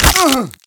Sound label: fall pain; Team Fortress 2